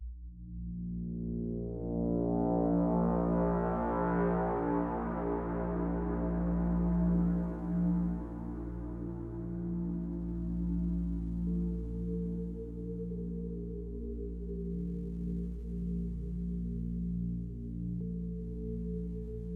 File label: OPENING CREATION LEVEL PAD; Semblance